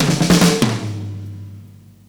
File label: Fills 144; Zero-G; Monster Beats